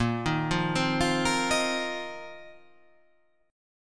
A sharp Suspended 2nd Suspended 4th down

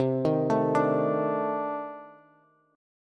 C Minor 6th Add 9th C Bass; Cm6add9 arpeggiated; JGuitar

